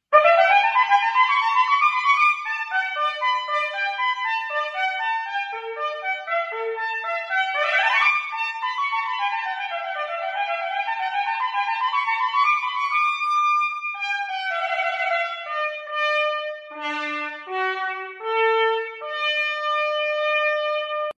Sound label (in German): Abblasen Ringtone